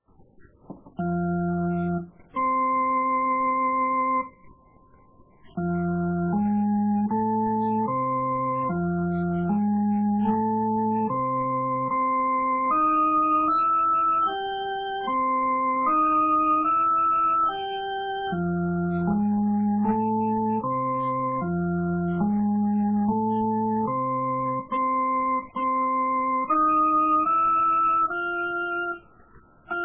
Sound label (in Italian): Accompagnamento RITMO BOOGIE